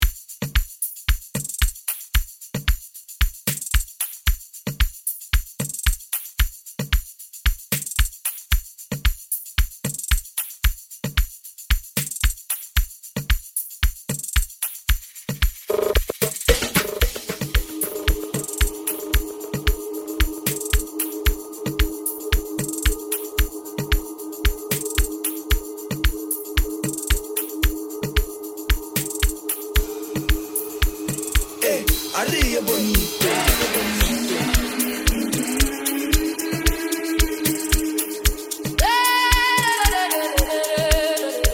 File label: Amapiano Remix